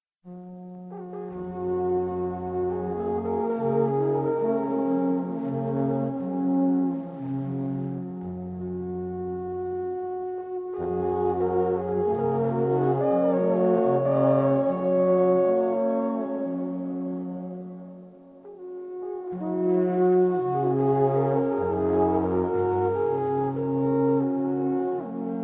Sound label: celtic, alphorn